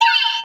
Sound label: Staff Slam Hit; GW3 Wizard Mini